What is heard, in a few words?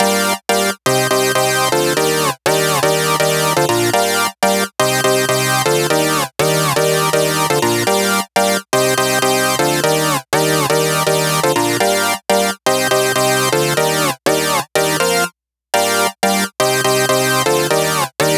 House Chord Drop 122 BPM DRY